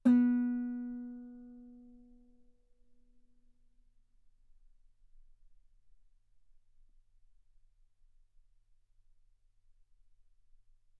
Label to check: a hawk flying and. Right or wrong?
Wrong